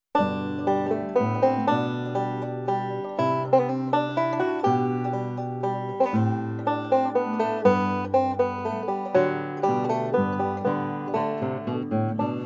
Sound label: menuet in G major, banjo; Notebook for Anna Magdalena; J. S. Bach